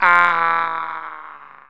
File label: wail; Panic Volcano; Tribes